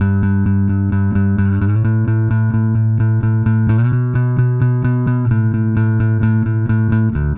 Bass, Indie Rock; Sonic Foundry (Sony Creative Software); Indie Rock Anthems; Session 10 - Roswell

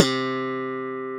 CHOP C2, bass; AKAI S6000 CD-ROM - Ultimate

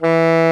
TENOR SAX E3; Greytsounds VOL-1

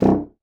C1, bassoon; Xsample VOL-6

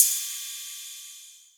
Cymbal, Novation Drumstation; DrumstationV1

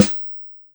Alumi55, Yamaha DTXpress, Acoustic Snare

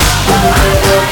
HIT 2 LOOP; Big Beat Samples & More; AKAI; ALL LOOP KIT

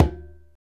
PRC LO CONG, Congas; Roland - Rhythm Section; Latin 1

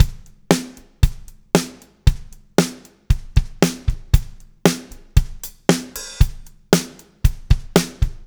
MED POP, Backbeat, R

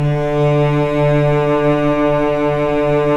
STR VC3, Vcs3 mf vb; String Master Series

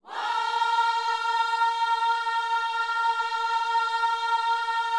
WHOA A#4, Gospel; Spectrasonics Vocal Planet

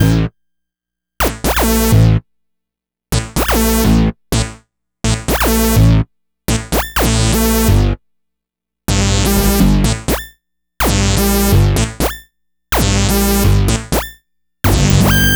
Bass, Afterhours EDM, Electro Shock

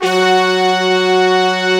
full BG brass; USB Soundscan vol.49 - Analog Fantasies; AKAI; YAMAHA

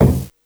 Perc Wadaiko Casio SA-1

